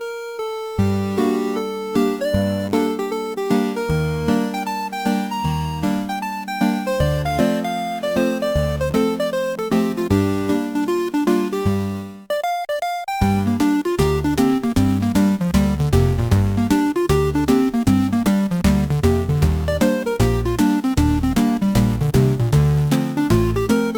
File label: tune, chiptune